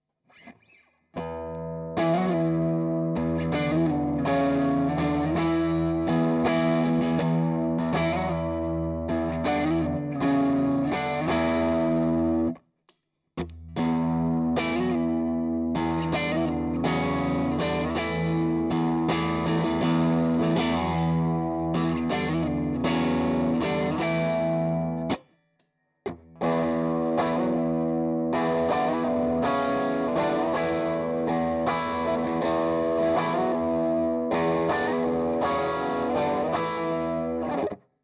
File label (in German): Oktaven OD TS9